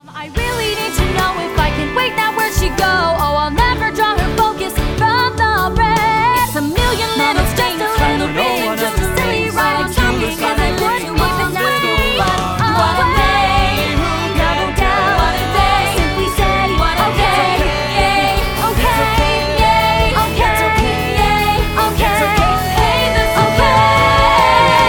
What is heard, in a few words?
Vocals